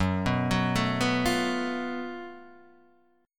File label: F sharp Minor Major 7th Double Flat 5th, Guitar, DADGAD; chordshape; down